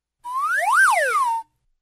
slide whistle both; butterfly island adventure

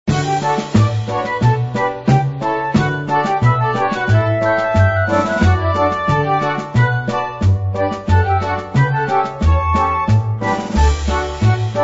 ball theme; friendship ball

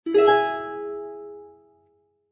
strum; butterfly island adventure

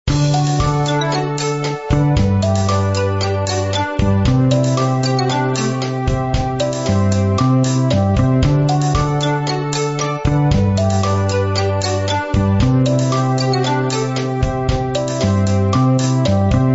indoor theme; friendship ball

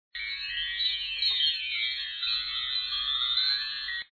magic twinkle; butterfly island adventure

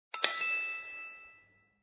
ring high; butterfly island adventure

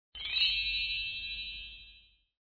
magic twinkle high; butterfly island adventure

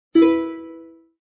low strum; butterfly island adventure

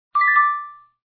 twinkle; butterfly island adventure

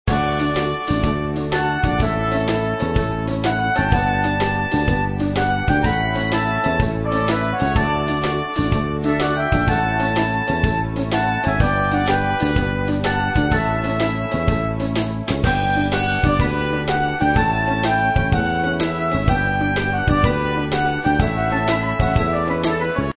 day theme; friendship ball

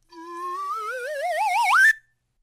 slide whistle up; butterfly island adventure

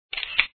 camera flash; butterfly island adventure

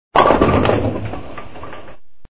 strike; butterfly island adventure

